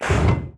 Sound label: docking rail, Door; Barotrauma; barotrauma ek